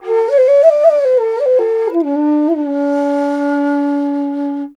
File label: INDIA BASS, IND BASS; Spectrasonics; Heart of Asia; IND BASS PH